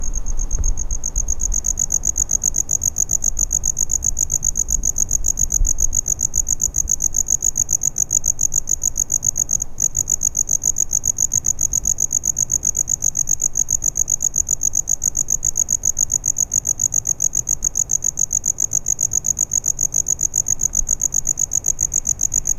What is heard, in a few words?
crickets and the sound of, evening